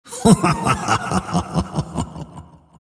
crown laugh; dota; monkey king

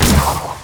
arrow; weapons; dota; drow ranger

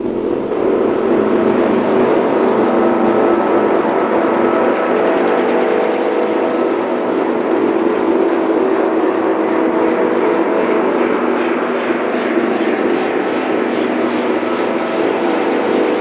focus fire layer; windrunner; arcana

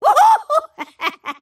laugh; snapfire

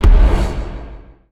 warp target; tinker

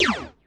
player shoot; dota; crownfall

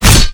impact; dota; abaddon

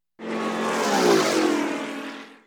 vroom, penguin; npc

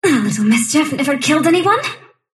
sylph move pain; dota; dark willow

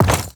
bone equip, inventory

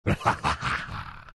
shadow demon laugh; dota

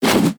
melee pre attack; terrorblade

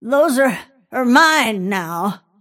last hit pain; snapfire

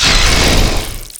life break impact; weapons; dota; huskar

